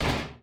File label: Oven Door